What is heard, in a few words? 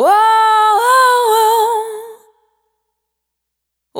Urban, Single Voice Melodic Loops, Vocal Samples